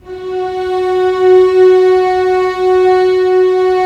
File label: STR SEC; Roland - LA Composer vol. 1